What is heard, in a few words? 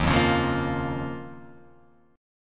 D sharp 7th Suspended 2nd, Guitar, Open F; D#7sus2 strummed; JGuitar